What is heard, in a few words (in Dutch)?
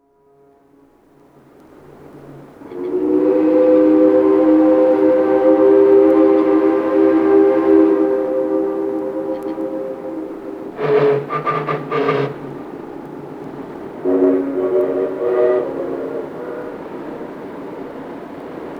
stoomfluiten diverses